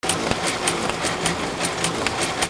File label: INDUSTRY